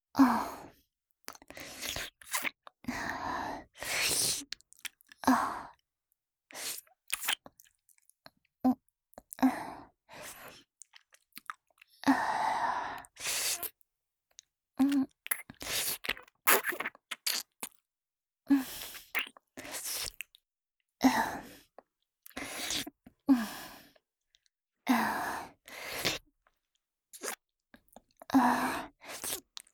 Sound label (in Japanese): 亀頭・陰茎を舐める; 実用ボイス抜粋集